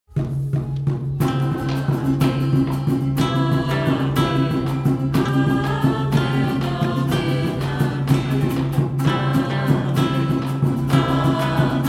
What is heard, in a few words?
amen